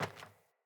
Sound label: Sandals Walk Metal